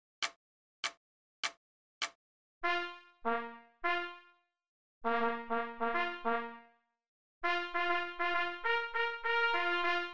Jubilaeumsgruss - Signalhorn

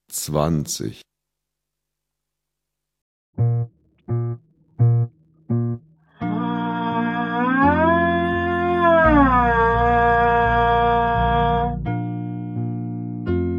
Klang, Hornschule

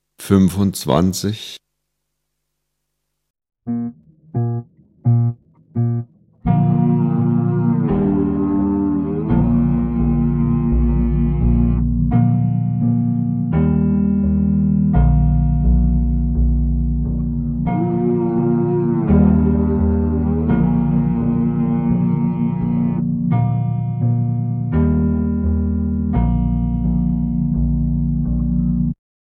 Klang, Hornschule

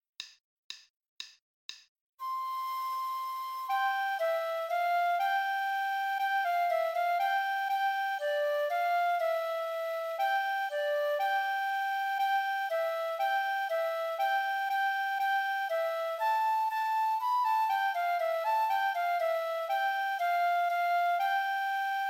Floete 2, Spielmannszug; Froehliche Weihnacht

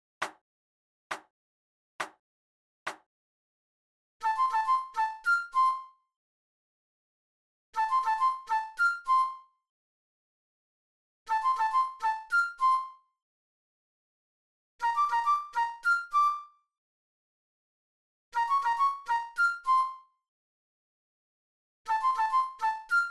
Morgens um 7 - Floete Solo

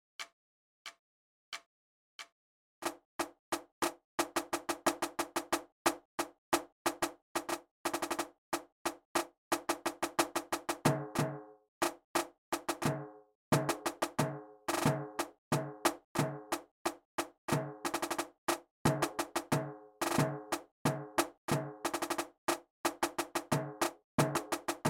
Leggiero - Rhythmus [90]

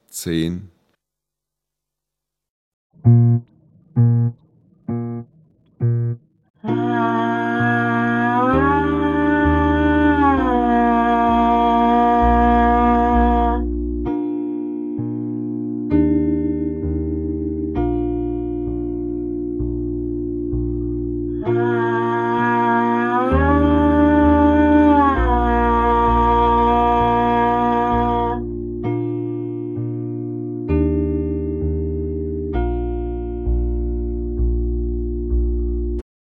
Klang, Hornschule

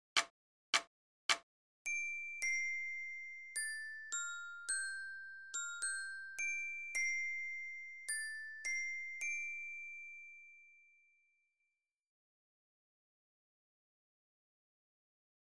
Lyra, Spielmannszug; Edinburgh Tattoo; Auld lang Syne

